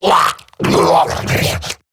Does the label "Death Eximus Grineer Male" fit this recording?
Yes